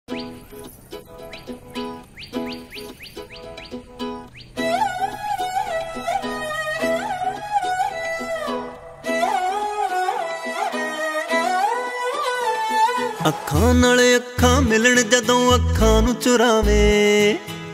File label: Punjabi Version